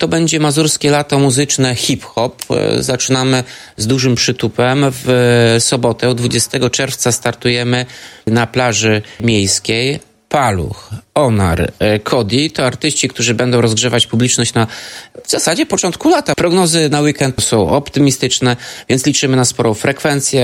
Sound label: hiphop